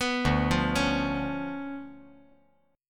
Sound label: D Minor Major 13th, down